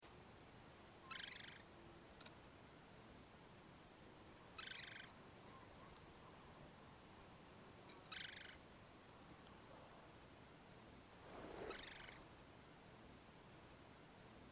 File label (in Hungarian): fako retiheja hangja